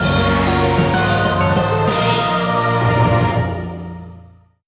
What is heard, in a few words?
Quest Finished SFX; Bloodstained: Ritual of the Night